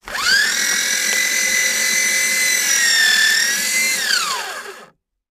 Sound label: paper shredder; fo papershreader